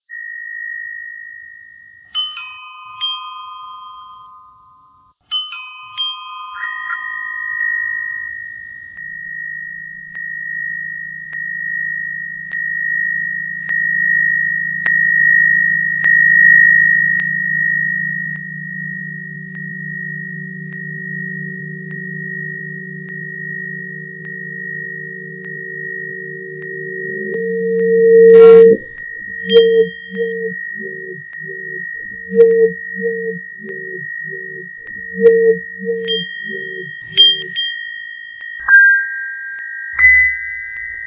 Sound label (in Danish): ringeklokke